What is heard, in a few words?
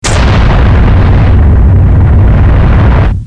ROBO EX